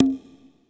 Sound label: conga open high